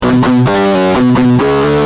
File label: G Jam, Guitar; Industrial